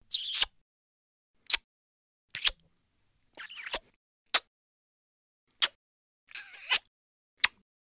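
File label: sound of a short kiss in a cartoon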